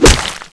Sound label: tomahawk hit